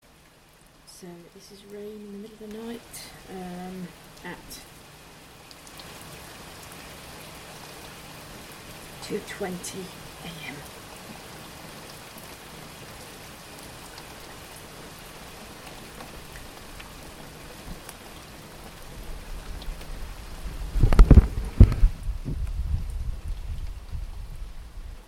heavy rain; at 2 20 am this morning

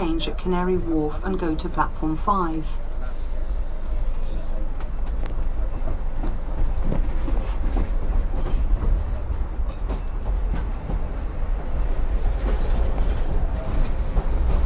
dlr canary wharf

